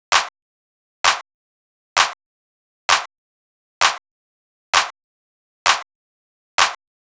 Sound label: Drum Kit, Clap, 130, Techno; Drum Kits; Stems; Ghosthack; Drum Kit 001